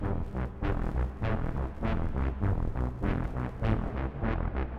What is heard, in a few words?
brass texture delayed; synth; instruments; loops